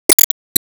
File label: Bombdaruma Active Throw